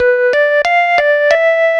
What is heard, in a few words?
2 Step 133 SYN, House; USB Soundscan vol.51; House Side Of 2 Step; AKAI